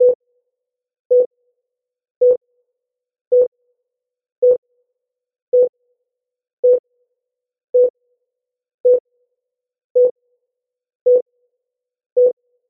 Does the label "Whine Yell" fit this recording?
No